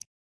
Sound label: running water, river sounds